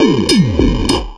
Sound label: Bumpers; Sickly Fx; Sonic Foundry (Sony Creative Software); Esoterik Beatz